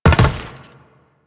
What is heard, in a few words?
Land Metal, Footsteps; Warframe; Teshin